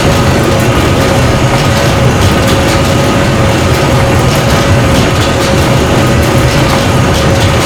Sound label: oilrig drill close loop